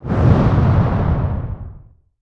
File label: woosh